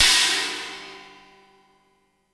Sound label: CHINA, HI-HATS; Spectrasonics; Burning Grooves